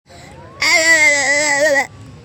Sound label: awawwawawa